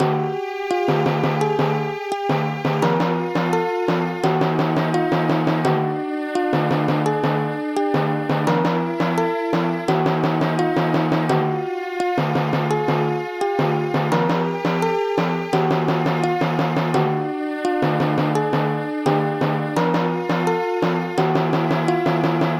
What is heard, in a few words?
suspense